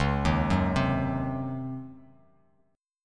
Db Major 7th Suspended 2nd Suspended 4th, Bass Standard; 9,6,4,5; DbM7sus2sus4 arpeggiated; JGuitar